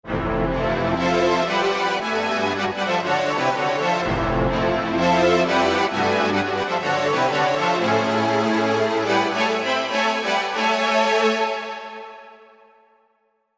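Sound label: Chord 7+, Korg MSC-1S; Cord 7+; Korg MSC-1S Variety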